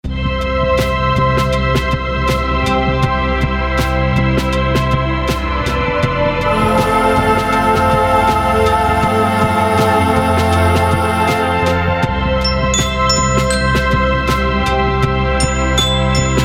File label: Uplifting Ending